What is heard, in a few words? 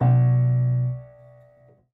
Prepared Piano